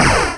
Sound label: brick hit; breakout